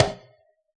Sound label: jungle kit bongo; Dr 202 Kit 1; Percussion